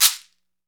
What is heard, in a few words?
CAXECERE, Latin Shakers